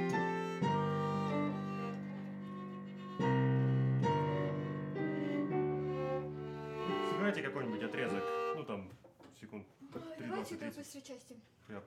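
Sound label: Viola C minor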